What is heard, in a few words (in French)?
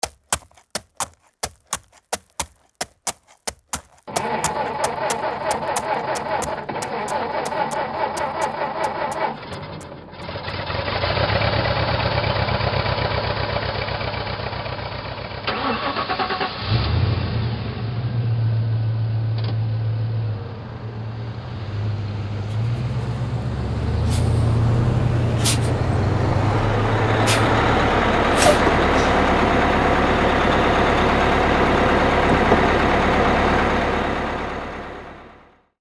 circulation